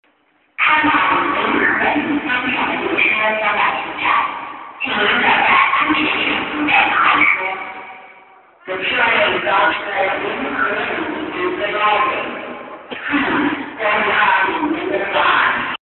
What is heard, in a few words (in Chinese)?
八通线 临河里方向 进站